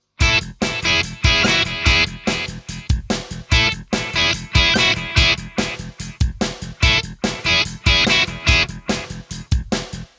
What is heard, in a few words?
Crunch